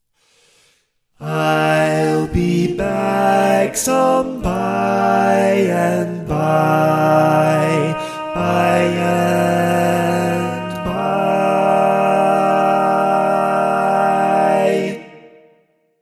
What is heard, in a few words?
Lead, barbershop tags